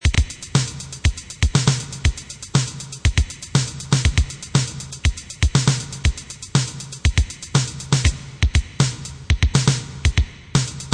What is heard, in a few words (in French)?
Style Funk, batterie; Cours de batterie; Exercices